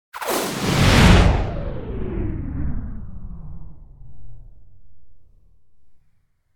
Whoosh Sci-Fi Weapon Blast; Hollywood Edge; sound designer's toolkit v2

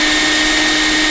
Shop Vac Loop; Tools; 1001 Sound Effects

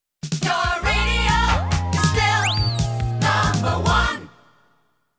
Your Radio Is Still Number One, jingles

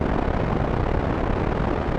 noise loop; Code7

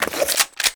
Gun Draw Select Pistol, Weapons Foley; SFX Kit

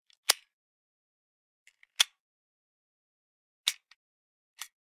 Unload Bullets From Magazine; Hollywood Edge; Heavily Armed

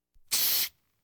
Household Phones

